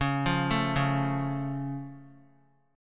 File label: Db Augmented, down, Guitar; arpeggiated; JGuitar